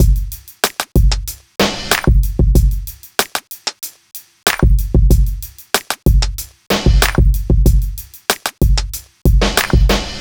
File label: Urban Beat, Drums